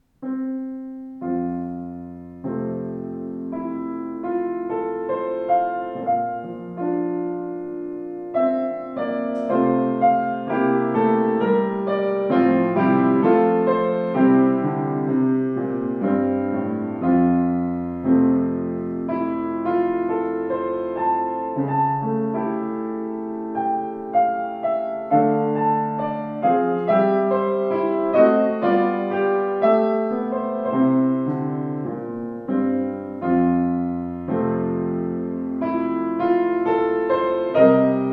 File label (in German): Bechstein, klavier; 124 bechstein elegance silent